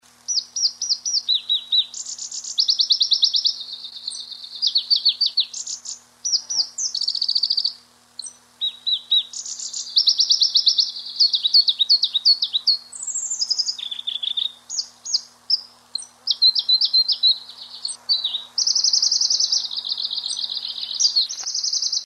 Pproregulus

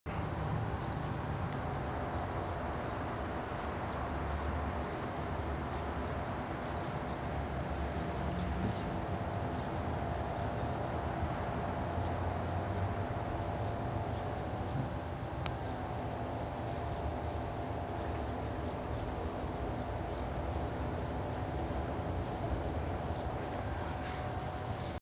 phylloscopus inornatus

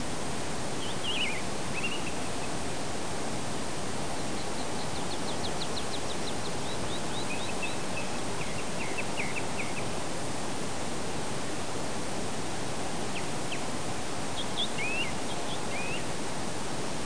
turdus philomelos

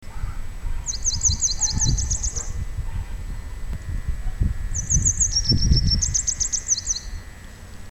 M cinerea song

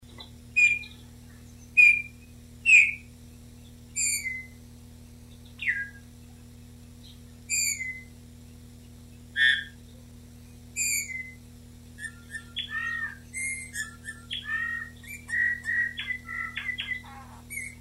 Male Pyrrhula pyrrhula pyrrhula

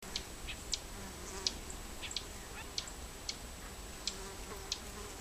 Ph fusc call

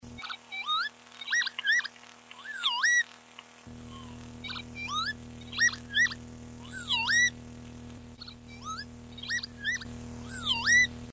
Vanellus

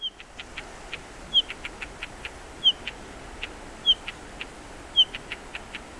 Monticola saxatilis female worrying near brood; Skriper

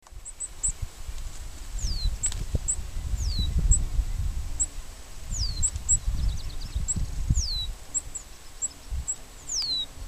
E schoen call juv & ad

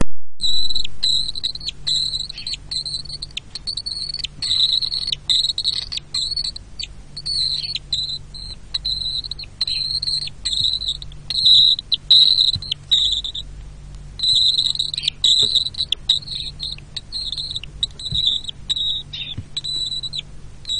apus apus

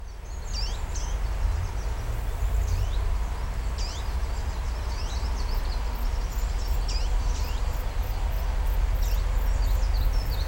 Phylloscopus proregulus call

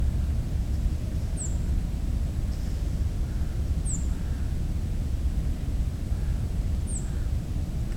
Emberiza godlewskii call

